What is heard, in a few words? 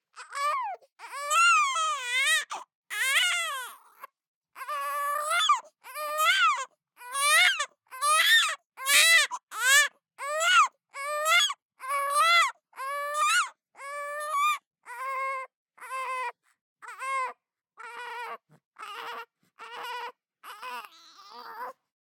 BABIES CRY Boy 3 months Increase Decrease; Baby Boom